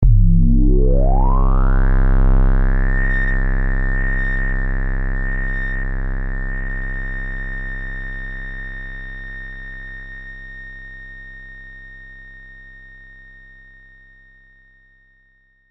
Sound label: Stab Synth High, Production, Element, Stab, Synth, High, Pitch, Ring; BlastWave